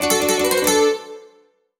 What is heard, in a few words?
Dominoes - Draw; Switch 2